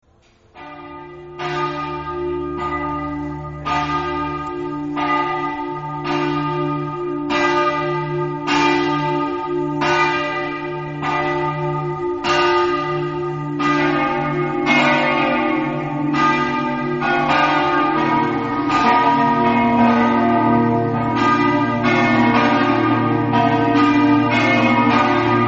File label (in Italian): campane; tarcento